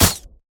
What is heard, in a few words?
weapon; ECZ Super Blaster